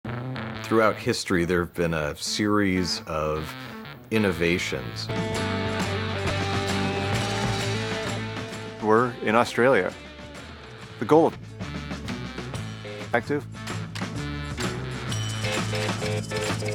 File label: drone; Not only Amazon, also Google works on delivering packages by drones